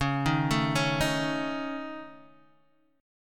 Db Suspended 2nd Sharp 5th Db Guitar DADGAD; arpeggiated; Dbsus2#5; JGuitar

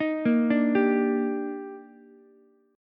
Eb Major 7th, Ukulele; EbM7; arpeggiated; JGuitar

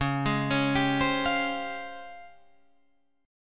C sharp Major Sharp 11th, Guitar Drop D

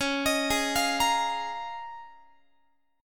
C sharp 6th Add 9th C sharp down Guitar; arpeggiated; C#6add9; JGuitar

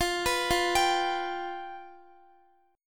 F Suspended 2nd Flat 5th, Guitar; Fsus2b5; arpeggiated; x,x,17,16,17,15

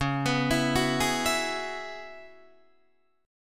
C sharp Minor 11th C sharp, Guitar DADGAD; C#m11 arpeggiated

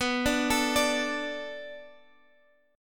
B Minor 7th B, Guitar; arpeggiated; 4E,4A,5D,5G,5C,6F; 19,17,19,19,x,x

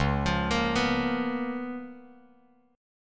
C sharp 13th, Bass Standard; arpeggiated; C#13 chord; JGuitar

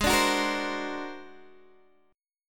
Ab 9th Flat 5th Ab down Guitar DADGAD

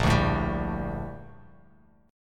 Bb Major 13th Bb Bass Standard; strummed; JGuitar

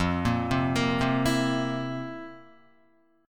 F Major 7th Suspended 4th F, Guitar; FM7sus4 arpeggiated; JGuitar

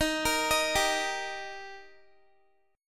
Eb Major Eb down Guitar 4E,4A,5D,5G,5C,6F; arpeggiated

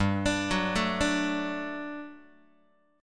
G Major 7th Suspended 2nd G down Guitar; GM7sus2 arpeggiated

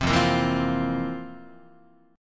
F Major 7th Suspended 4th F, Guitar; strummed

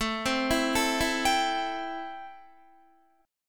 A-Minor 7th A Guitar; 17,15,14,14,16,14; arpeggiated; Am7

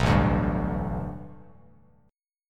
A 7th Suspended 2nd Suspended 4th Bass Standard

